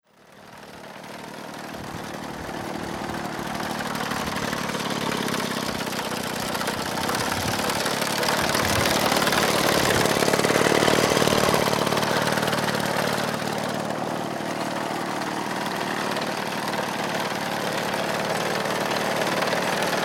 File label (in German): Reform 58 Motormäher